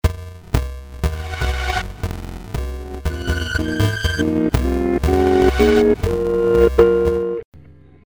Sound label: glitchy